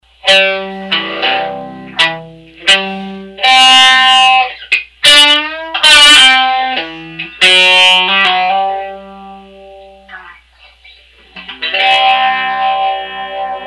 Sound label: phaser; electra